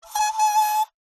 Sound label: vibrations cello bow styrofoam cooler short; sound spark